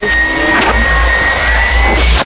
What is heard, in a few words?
ambient roof top war; hl2